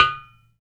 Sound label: UDU, African